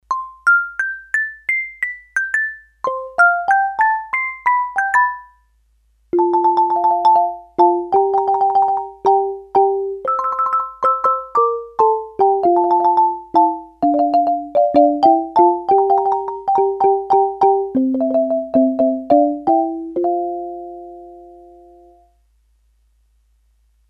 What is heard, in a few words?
Marimba, Korg DS-8; Factory Patches